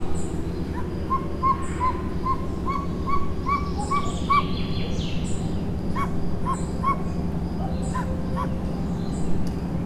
háttérben dieselvonat is, szirti sas; szegedi zoo 2011

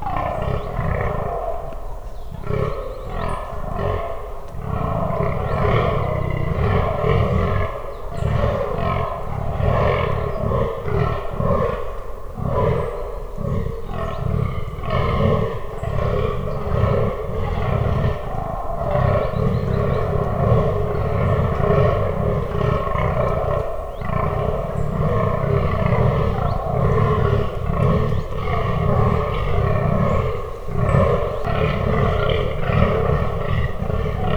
barcognak, dámszarvas; termeszetben

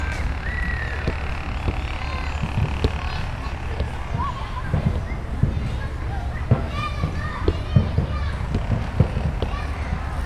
mozgalmas jatszoter

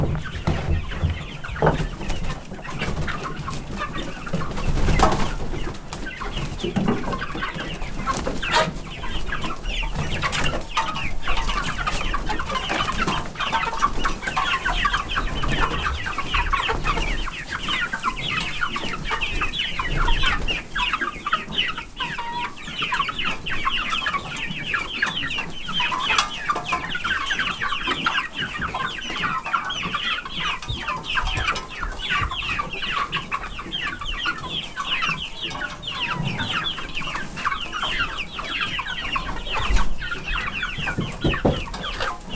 ól bezárva és kinyitva, csibe magyar tyúk; állathangok; paraszt udvar

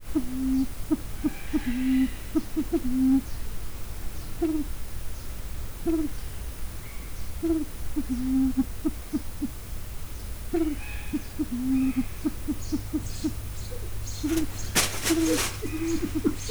hangot ad száraz leveleken lépked, ezüstfácán; miskolci zoo 2009

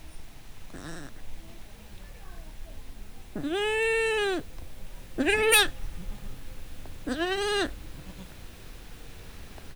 gida közelről szél; állathangok; paraszt udvar; házi kecske

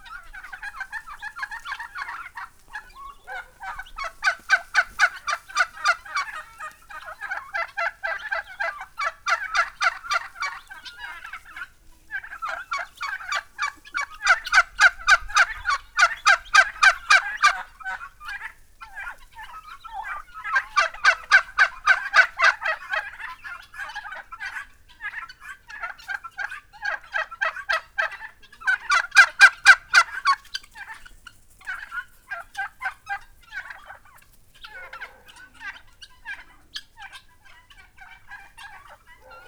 gyöngytyúk is, pulykakakas; szolnok; parasztudvar

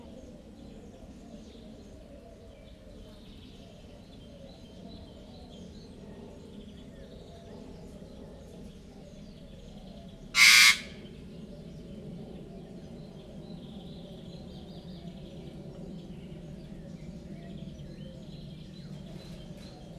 még egyet rikolt, zöld szárnyú ara; jaszberenyi zoo 2015

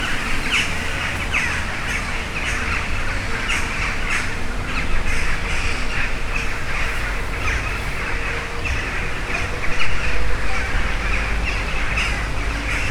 csókák busszal

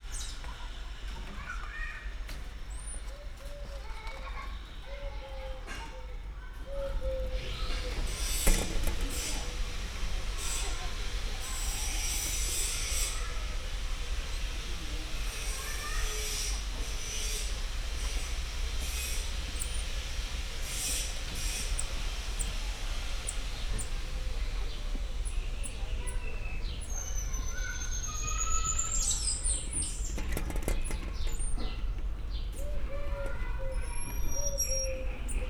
nagyon halkan, vöröskezű tamarin; szegedi zoo 2011

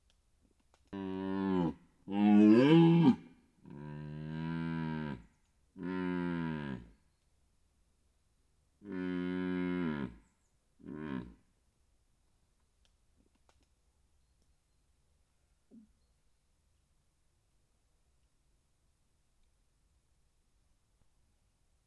gímszarvas madár; budakeszi zoo 2010

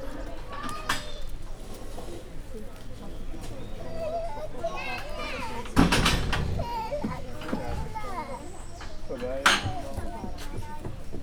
látogatók, parasztudvar; Győri Zoo 2014

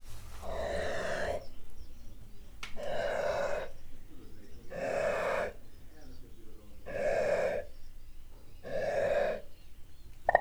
csibéit védő, ritka hangot hallat, pulykakakas; állathangok; miskolci zoo 2009

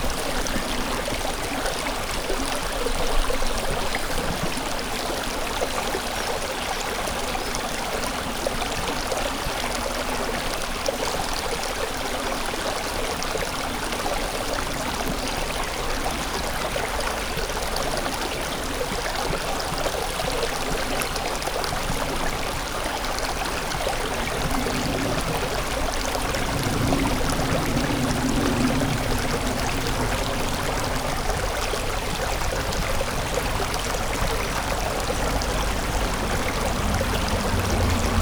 patak; patak 2014; szep hangu; zorejek; halk repulo; visegrad

